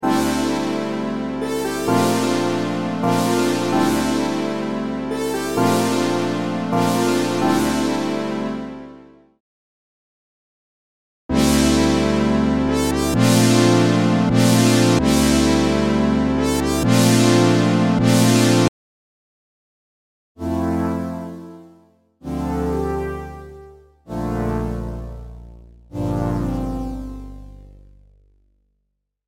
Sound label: Esper Synth